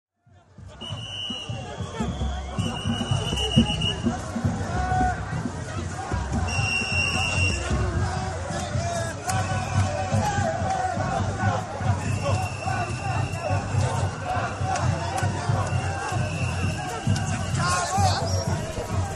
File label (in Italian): Manifestazione antirazzista – Tamburi grida fischietti Africa; roma forestiera